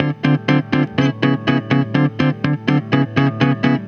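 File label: guitar, Roots Disco; USB Soundscan vol.32; AKAI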